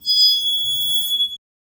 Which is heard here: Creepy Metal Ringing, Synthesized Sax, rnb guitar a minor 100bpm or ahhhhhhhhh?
Creepy Metal Ringing